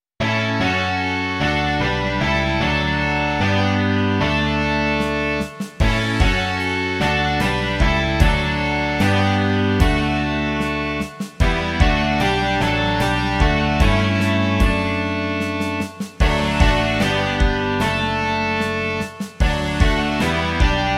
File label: rock